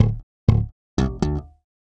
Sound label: Slap Quarters, Bass Drum - Kicks